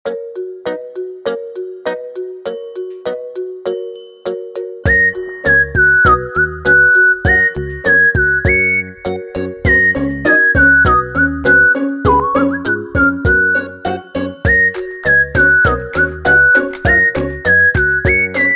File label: Tone Whistle; 360 N6 Lite; ringtones